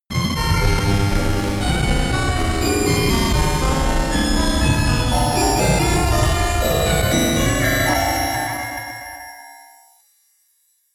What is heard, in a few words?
horror orchestra long